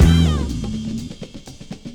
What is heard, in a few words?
Sample Beat, Trip-Hop Loops; Sonic Foundry; Rondo Brothers Trip-Hop Loops & Samples